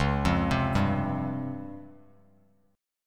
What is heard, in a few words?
C sharp Major 7th Flat 5th Bass Standard; C#M7b5 arpeggiated; 9,8,10,0; JGuitar